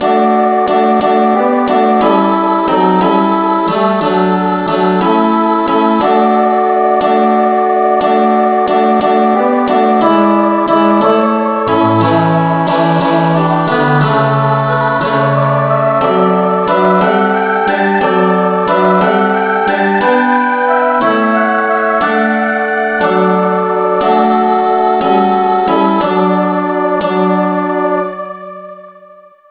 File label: angel voices rev no vocal key d